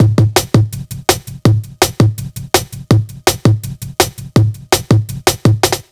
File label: 165 break, Explosive Jungle; USB Soundscan; AKAI